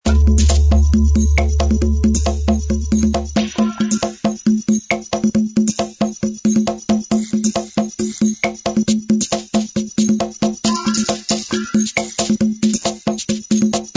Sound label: Music End Checkpoint